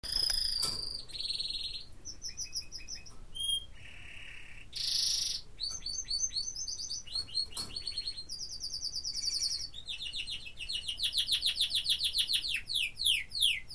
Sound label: tweeky sings